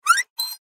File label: Metal Hinge Lever Squeak; Metal Doors; Foley